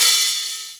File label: Hat, Ensoniq ASR-X